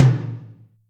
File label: TOM Xfad L, Acoustic Tom; Yamaha DTXpress